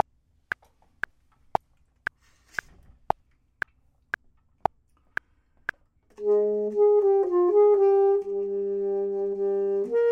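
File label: bottom, Saxophone and Clarinet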